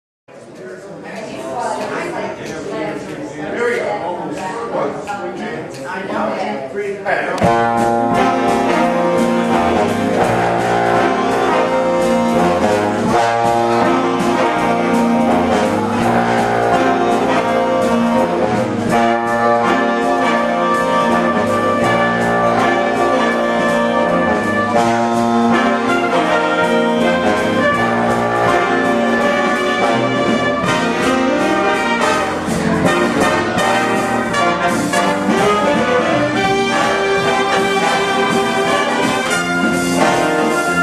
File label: Jingle Bells Jazz, Big Band